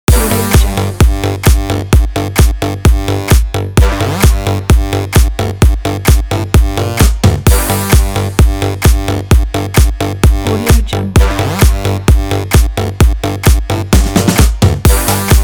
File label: melbourne bounce loop